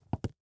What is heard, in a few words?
FOOTSTEP Leather Wood Hollow Jog mono; Phasmophobia